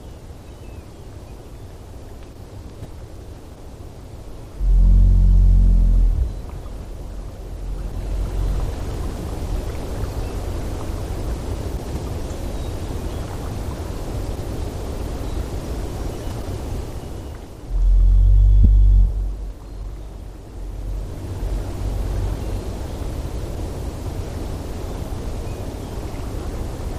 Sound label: Low-Pitched Humpback Whale Calls; Alaska; MAMMALS